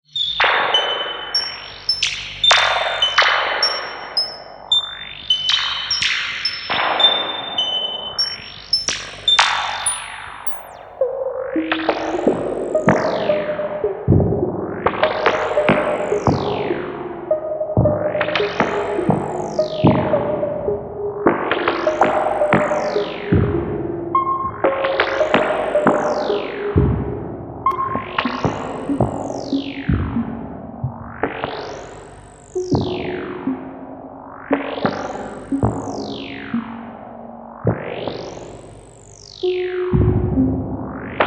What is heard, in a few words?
Moog Modular